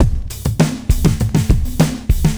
Club Tip, R&B Drums; Sonic Foundry (Sony Creative Software)

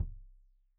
Kik Stereo; Deeply Sampled Drums; SMDrum

